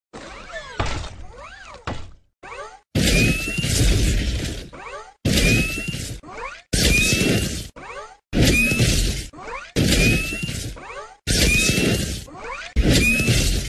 Iron Man Repulsor

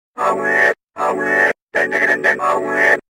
Telephone Startup

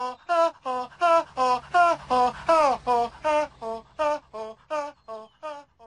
French Ambulance

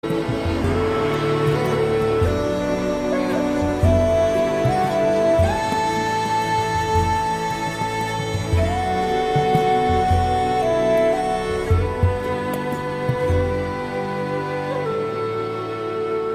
Skye Boat Song Instru

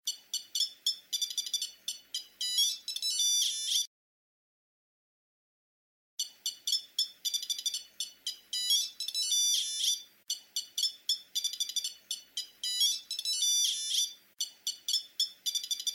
Nokia 2100 Birder

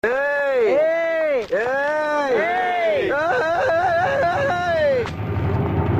Crying; Vadivelu

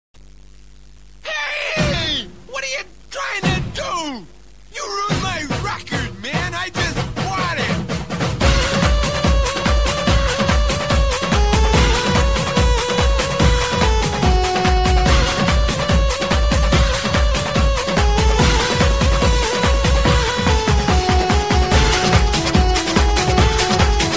Nagin

